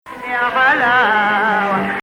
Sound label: Hijaz